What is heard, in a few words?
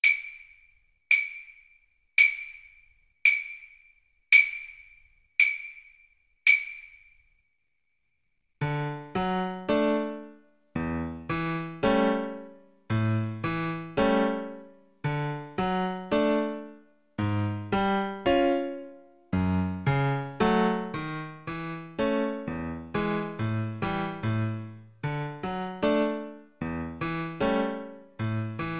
hours kar, Flute